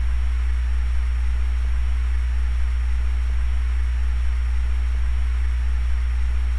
microphone noise

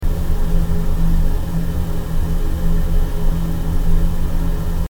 air conditioner

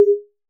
button

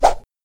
swing, robot

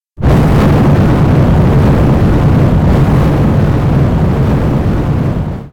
dragon breath; monster

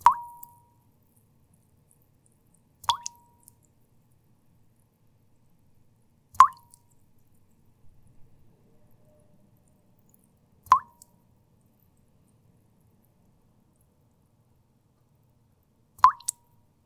drops on bowl, 茶碗に水滴; wetarea

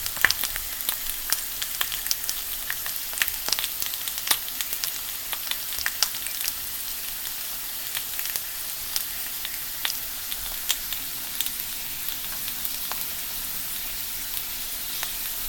roasting bacon; cooking

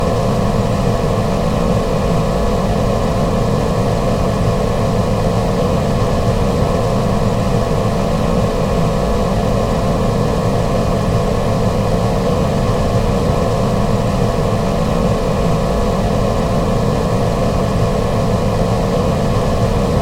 going mad; horror

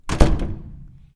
hotel door

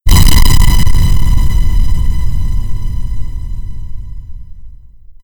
shocking beam

